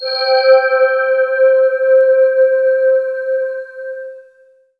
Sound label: SYN SY Glas; Best Service Gigapack 1; SYN DIG3